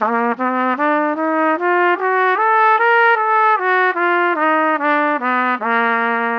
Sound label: Trumpet